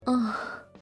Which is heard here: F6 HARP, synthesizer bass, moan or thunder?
moan